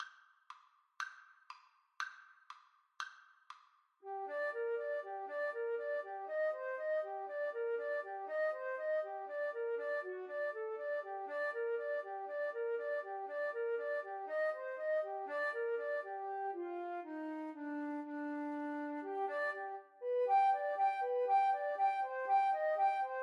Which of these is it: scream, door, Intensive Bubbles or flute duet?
flute duet